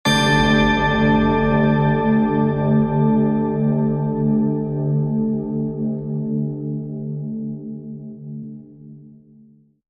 Magic Chime

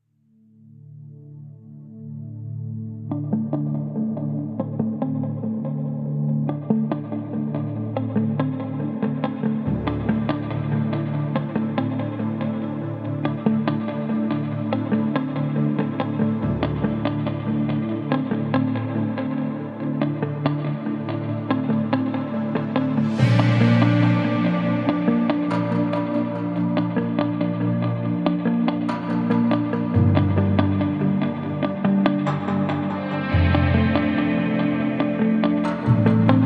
Tension Bed

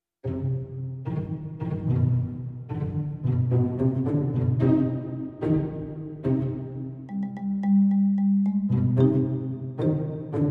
Tango, No Woodwinds

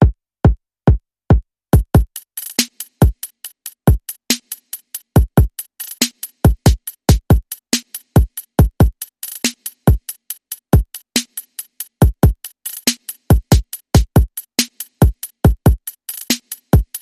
Beat

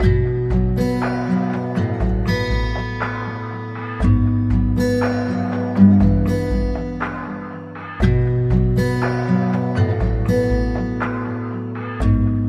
Loop

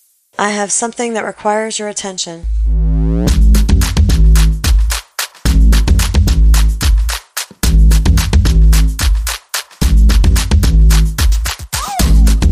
Clap That Beat

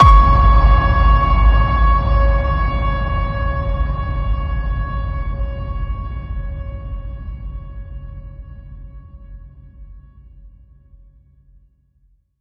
Ping Of Doom, C sharp

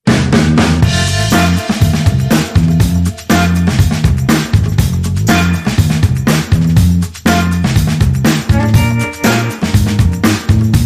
No Brass No Guitar Lick

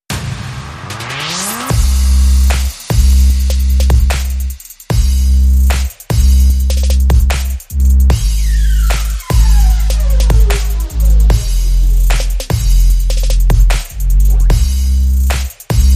Bass Drums and SFX

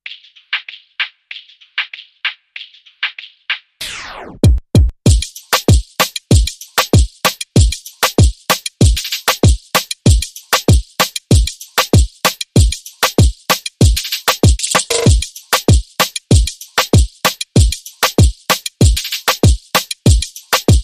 Arcade Beat

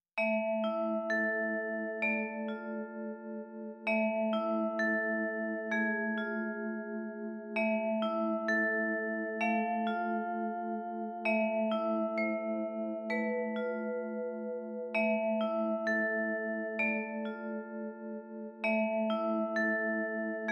Vibraphone

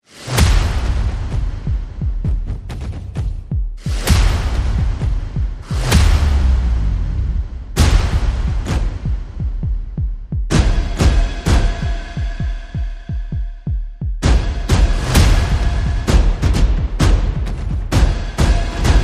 Percussion and Effects

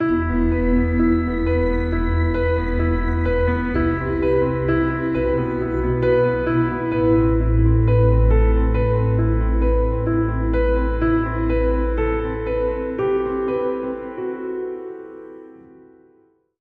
Piano

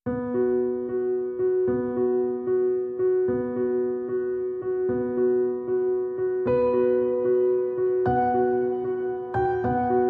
Solo Piano

